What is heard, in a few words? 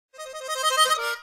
Sms Ringtone